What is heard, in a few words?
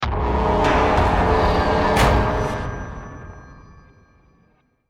Stinger Action Medium